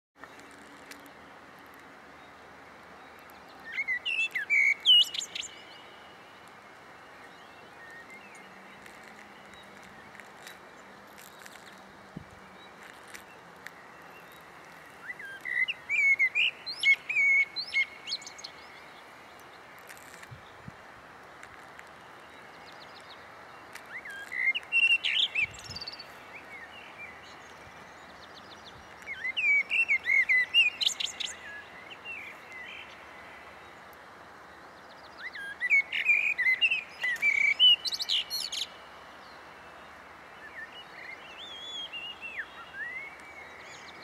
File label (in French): Merle noir Turdus merula